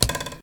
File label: arrow hit; minetest; x bows